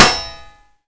hit metal

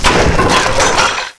crash

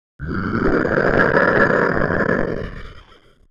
alert; scmod; humanerror; agrunt

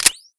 usp laser, weapons

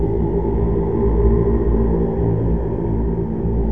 chant loop

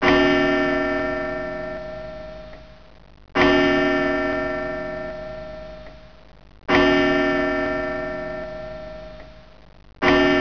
clock chime

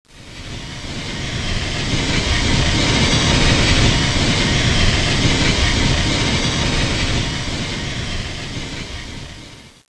poison gas

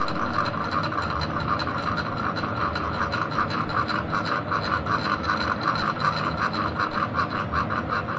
small fan

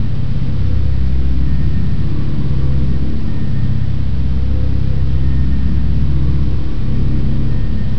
hum loop

